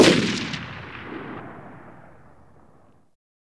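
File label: colt exterior shot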